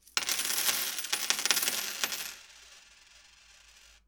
Small Coins Spilt

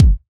Dnb kick

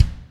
Stereo Processed Bass Kick Drum

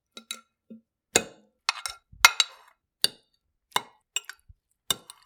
Fork Stabbing Food Eating Clinking Plate

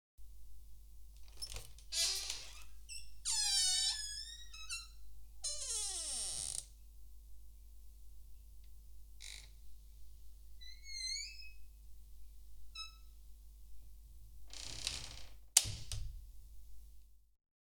Creaky Door

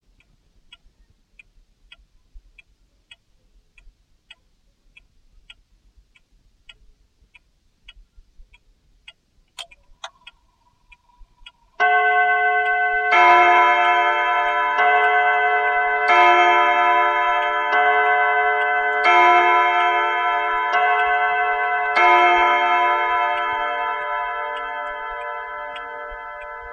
Wall Clock

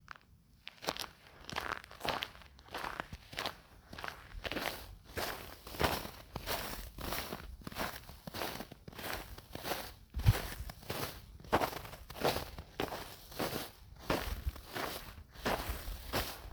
Walking In Cold Snow

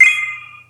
Start Beep

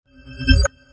Hud Close

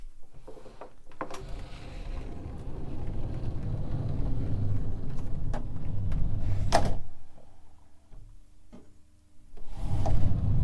Slide Door

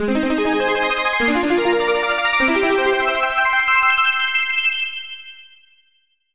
Achievement

